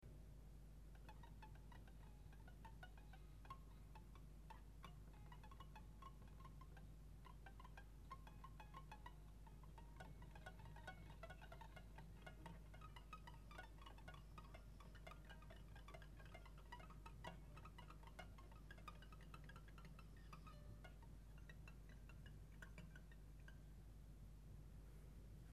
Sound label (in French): ongle, harpe